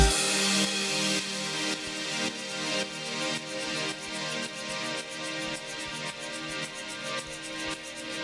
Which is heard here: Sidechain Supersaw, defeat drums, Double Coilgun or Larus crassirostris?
Sidechain Supersaw